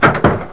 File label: bang